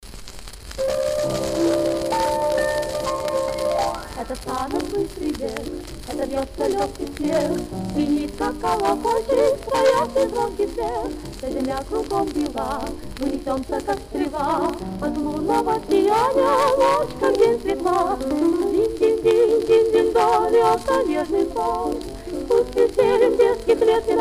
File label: vokalnyiy duet